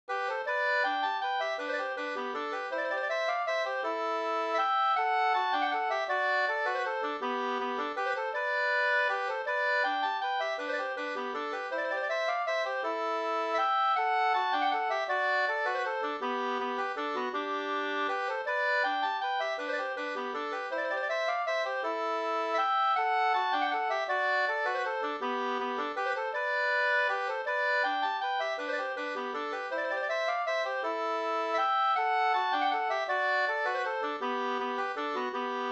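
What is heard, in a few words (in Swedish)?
vals, two-part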